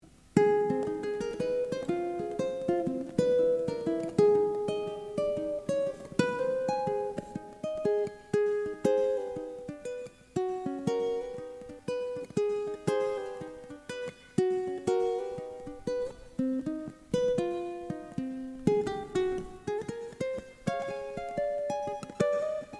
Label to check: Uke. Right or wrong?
Right